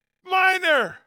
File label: coin minor; coinrain